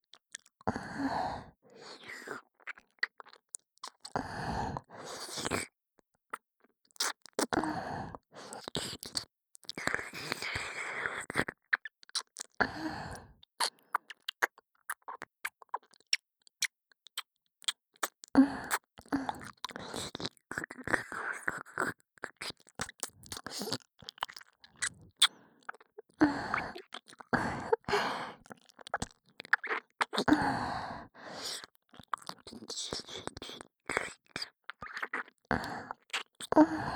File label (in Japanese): 右耳舐めループ 耳塞ぎ無し, ASMR